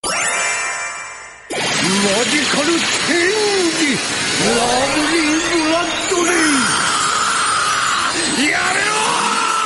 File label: magical change